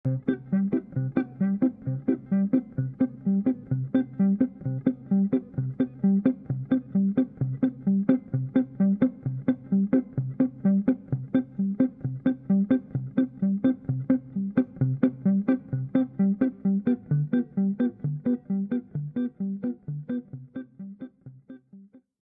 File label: solo